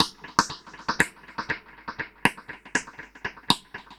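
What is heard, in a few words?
Drums Snare Echo 120, dub drums samples, 120bpm; musicradar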